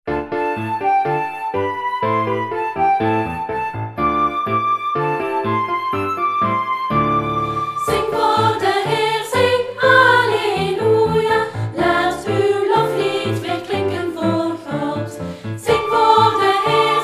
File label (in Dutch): Zing voor de Heer